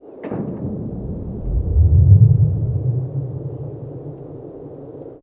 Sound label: Seismo Example Original; augmented audification; sonification